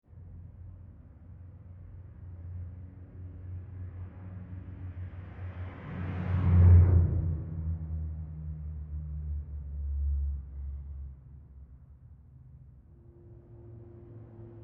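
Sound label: Traffic interior Residential; Traffic and Cars; Backgrounds; BigRoomSound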